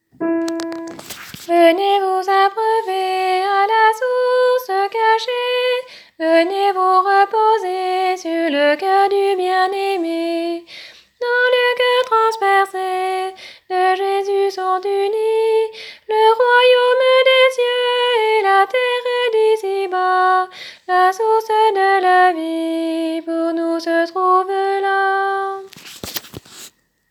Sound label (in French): Venez vous abreuver Soprano